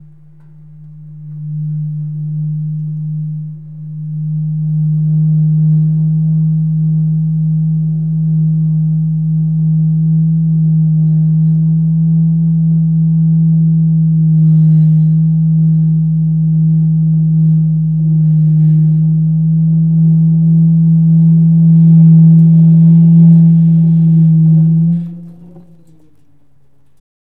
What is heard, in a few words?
Paper Pyrophone